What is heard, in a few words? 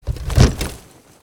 Menu Open Container; Custom UI Sounds; Call of Duty Ghosts